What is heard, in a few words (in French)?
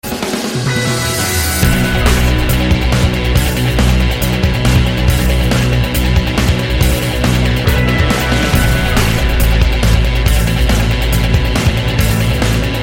Batteries